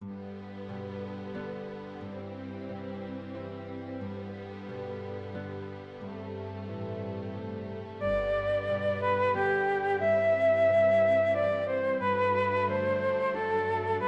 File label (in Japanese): 弦楽器とハープの伴奏に、フルートの旋律が出てきます。; オーケストレーション：前半・フルートの旋律; 旋律と伴奏の音域が、かぶらないように